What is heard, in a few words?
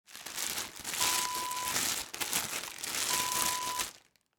plastic bag rustle